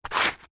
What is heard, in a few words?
industrial sand paper single sand plastic rub